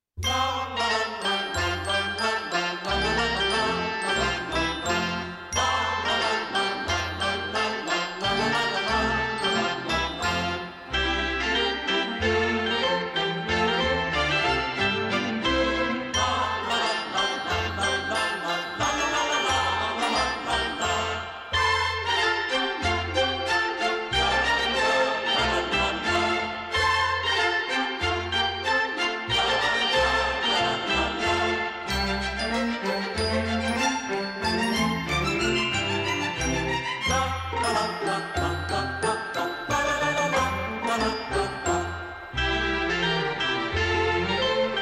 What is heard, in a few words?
Deck Halls